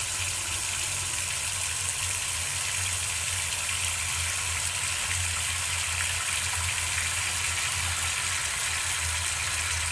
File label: running water; ragemap2023